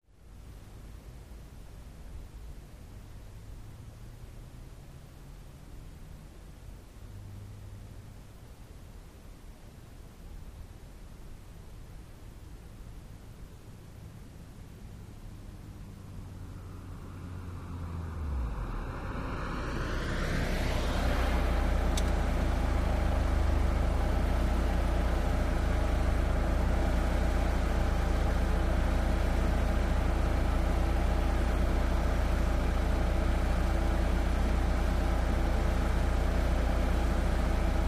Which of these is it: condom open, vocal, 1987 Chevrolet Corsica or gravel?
1987 Chevrolet Corsica